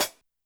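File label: Hi-Hat Pedal H; YAMAHA RM1X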